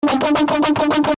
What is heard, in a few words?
Nasty Gtr D